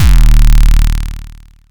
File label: Sfx, Trance; Zero-G Trance Inducer; General Sfx